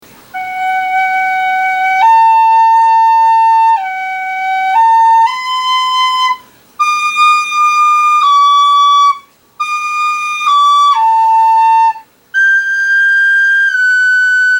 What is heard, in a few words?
Tin Whistle